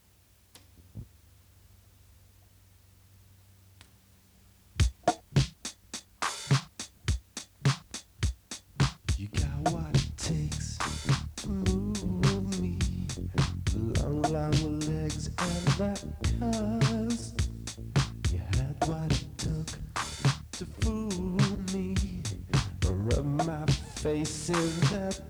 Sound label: Vocals